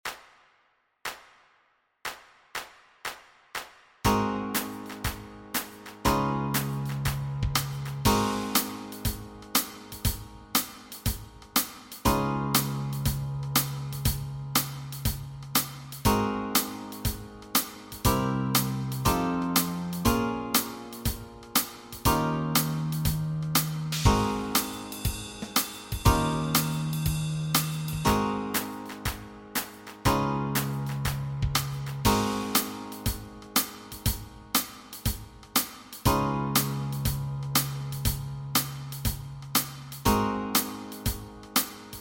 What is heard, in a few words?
Backing Track, walking bass